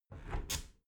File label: window close soft